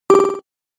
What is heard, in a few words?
Retro Videogame Fx Correct Sound